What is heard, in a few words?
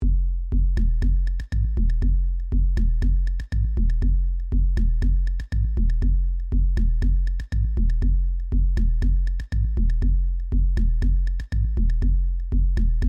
Drum